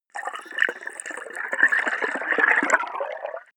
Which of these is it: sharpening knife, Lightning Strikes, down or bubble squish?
bubble squish